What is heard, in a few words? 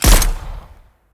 pistol up